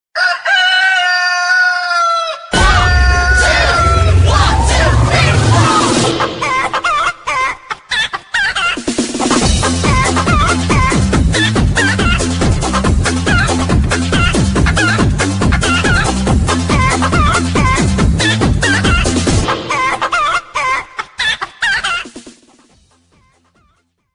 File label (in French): Le coq qui chante